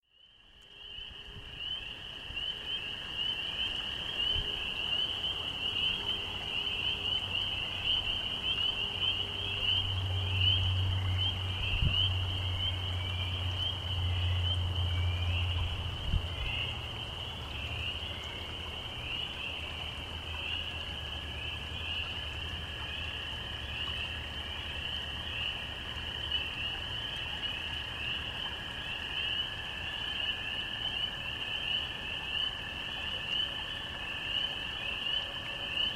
Spring Peepers and American Toads Stream Restoration Site by Bird Box; with Alert Calls